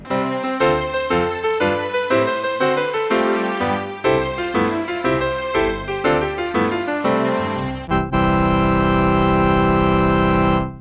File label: TaDa; yellowbrick